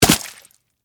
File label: Impact Flesh; Horror, Impact, Flesh; Blood and Guts; Blast Wave